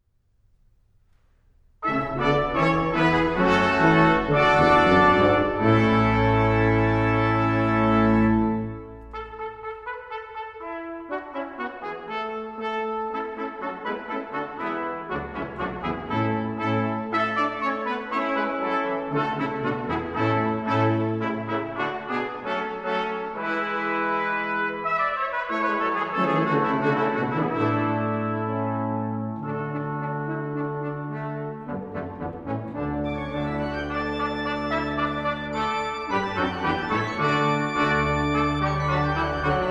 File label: Good King Wenceslas, Brass Ensembles, Christmas; arr. Gills